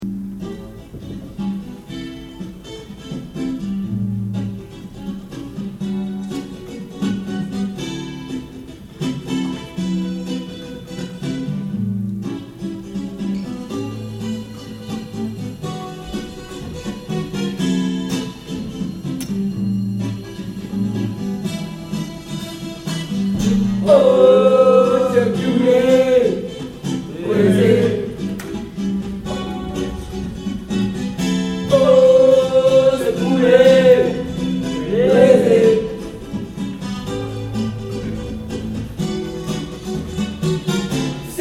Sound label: blues sans enf; kfc